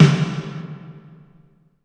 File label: IMP SNR DRUM, Drum, Cinematic Impact